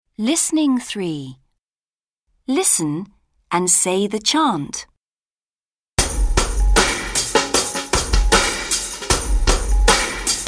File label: Chant